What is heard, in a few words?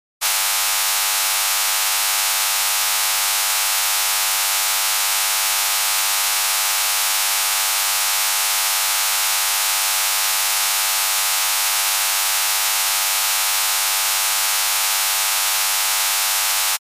Electronic signal